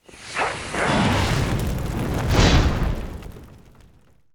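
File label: servant gargoyle attack; monster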